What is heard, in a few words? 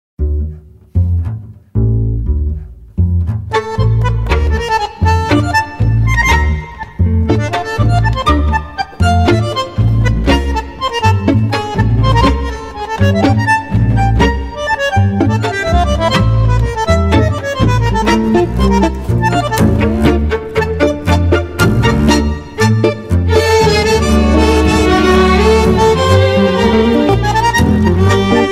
tango Music